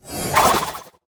pandas skill hat throw; wukong tower

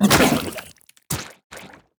enemy death Spawn; sfx; mindless Husk

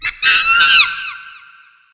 angry god scream

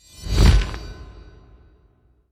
halloween bone dragon respawn

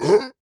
enemy shackler death

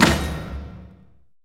hero select

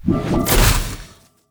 attack; raggified

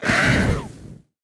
dragons enemy lava basic evolution; sfx

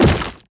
heroes veggiefy death; stregi

